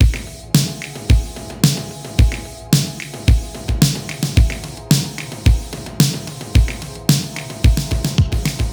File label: Drums Loop